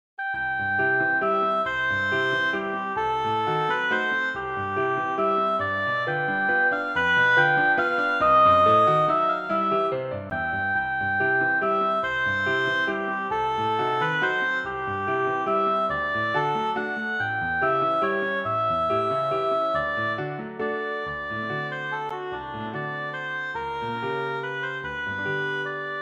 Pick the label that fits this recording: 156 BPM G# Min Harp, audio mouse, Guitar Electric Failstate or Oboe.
Oboe